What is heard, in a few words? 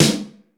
DRY S, drum; Crypton - DrumBase5000; DRY KITS